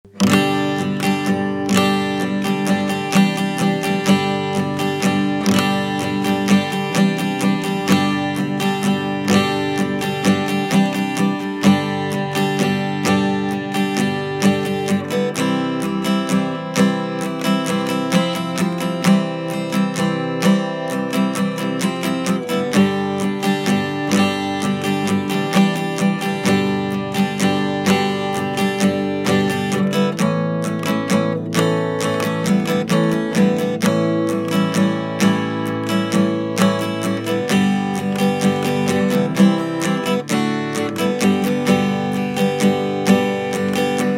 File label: Acoustic Guitar